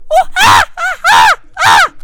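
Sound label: monkey scream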